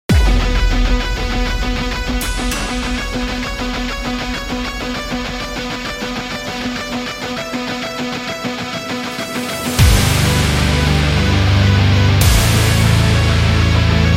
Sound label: Melodic Death Metal